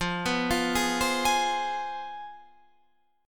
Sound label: C Major 7th Suspended 4th Sharp 5th, guitar